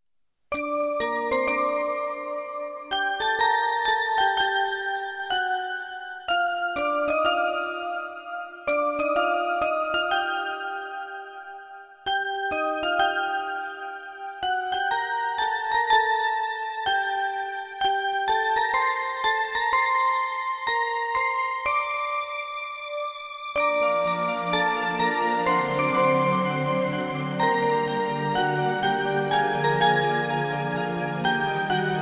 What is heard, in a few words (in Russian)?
Мелодия на часы Гимн Красноярска